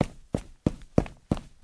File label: Running on Concrete with Tennis Shoes; des bruits de pas